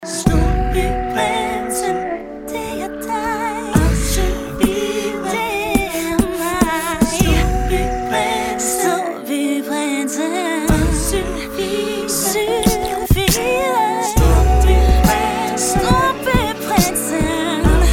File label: beatbox ballad